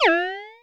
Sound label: jump